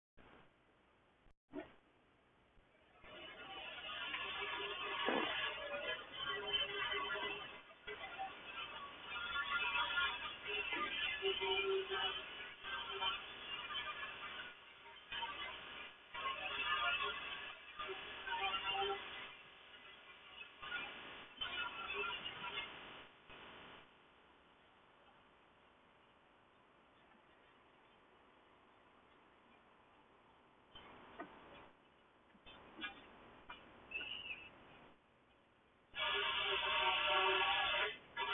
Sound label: car sound